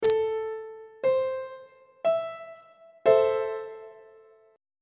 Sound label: b double flat minor chord on treble clef